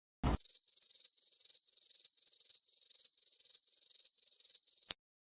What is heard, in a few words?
awkward cricket sound effect cricket silence sound